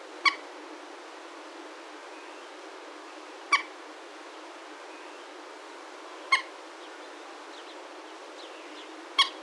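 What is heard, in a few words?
Gallinula chloropus adult; Common gallinule; Calls of a female, calling a chick became detached from her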